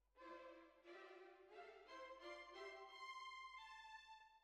Bassoon, Jazz for piano

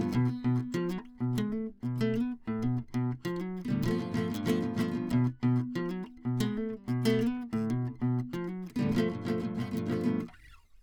Classic Guitar

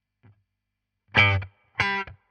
Guit Solo, RNR for piano

